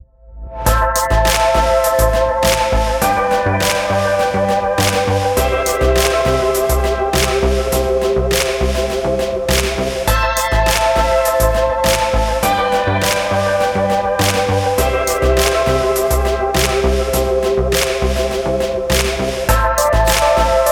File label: Future Bass